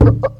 Kick

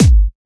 Kick, Kicks; FLPRO

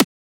Transient; FLPRO

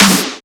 Snare Clap; FLPRO

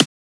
Transient; FLPRO

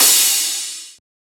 Crash

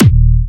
Fatterr - D#4, Kicks, Big Room Kicks

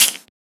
Open Hat; FLPRO

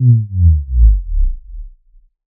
SubDrop Bold Gate

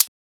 Shaker

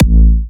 Hitter A4, Big Room Kicks

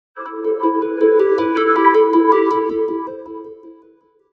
Windows XP Startup Sound in Cutter

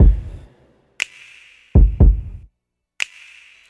Loop

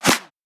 Shifted Clap, Claps; FLPRO

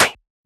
Perc, Percussion; FLPRO

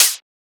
Closed Hat

